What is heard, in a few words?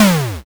explosion s